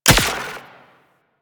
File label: Turret Fire; Gyro Liset; Liset Powers; Vehicles